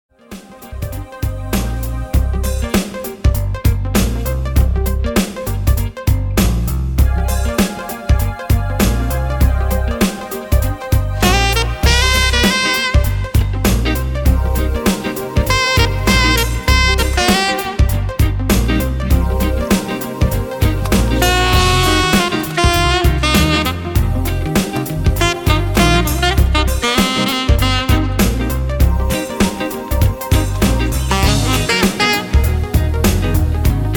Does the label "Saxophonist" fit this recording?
Yes